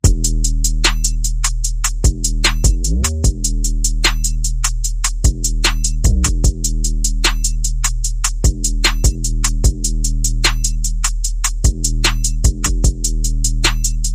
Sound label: Bass and Perc Only